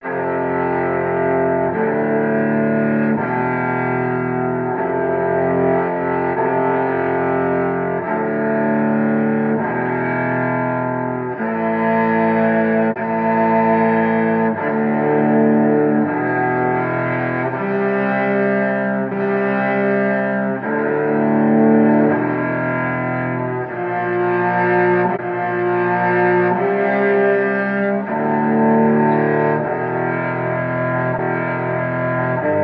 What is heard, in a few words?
strings midnight, Sad